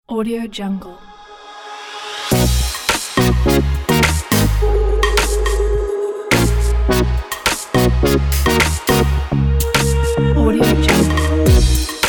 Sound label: urban fresh background